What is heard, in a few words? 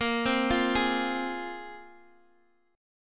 A sharp 7th Suspended 2nd down Guitar DADGAD